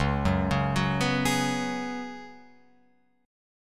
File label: C sharp 7th Suspended 4th C sharp, Guitar Open F; C#7sus4; arpeggiated; JGuitar